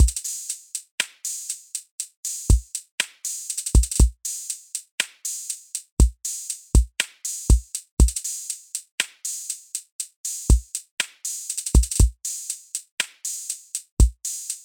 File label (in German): Analoger Drumcomputer